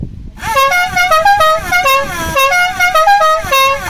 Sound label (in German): Fanfare